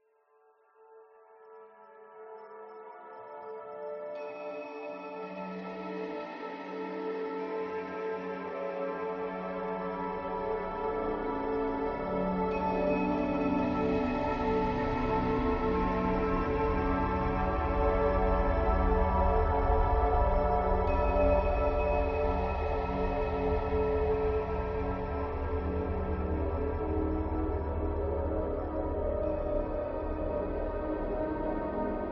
horror atmosphere dreamy into dark evolving nightmare; scott lawlor